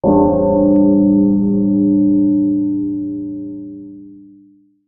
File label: Music event; Power Pro Kun Pocket R